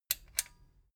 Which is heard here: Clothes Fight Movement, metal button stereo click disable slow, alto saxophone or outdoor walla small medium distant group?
metal button stereo click disable slow